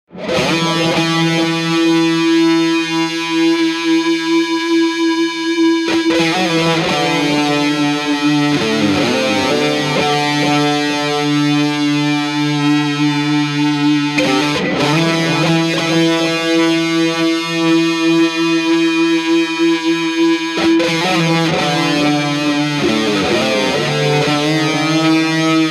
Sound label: Strat